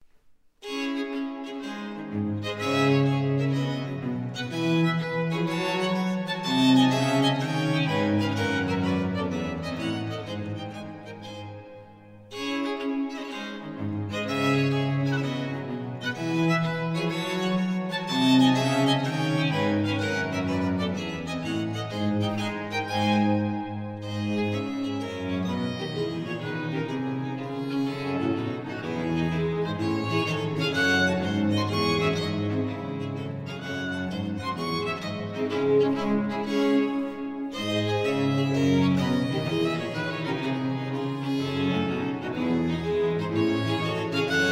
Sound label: Sinfonia Gigue